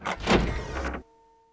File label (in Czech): dvere otevreni; Mafie 1